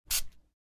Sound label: After shave single spray